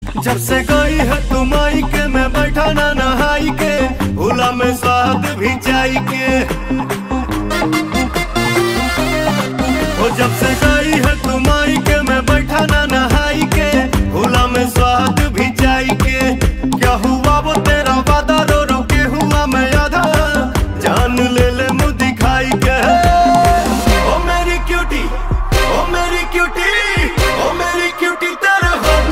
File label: Ringtone